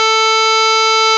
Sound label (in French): harmonica